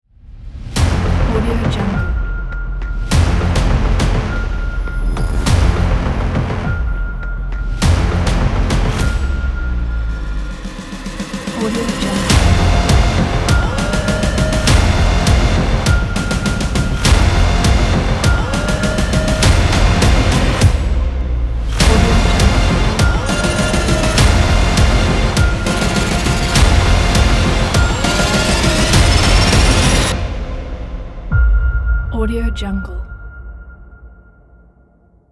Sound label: aggressive hybrid trailer; goodideaproduction